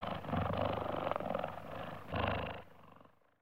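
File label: Saber Tooth Eating; creatures pets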